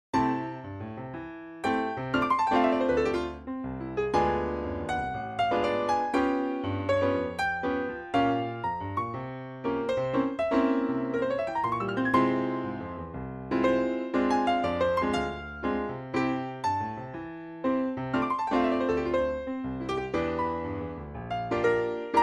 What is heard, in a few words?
swing